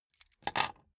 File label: key ring small place table